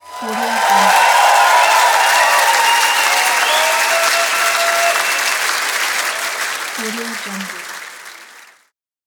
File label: applause crowd